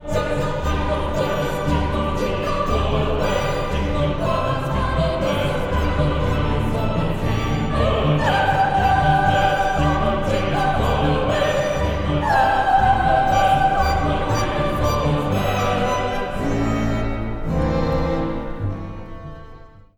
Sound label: Coro da camera, Jingle Bells; Conservatorio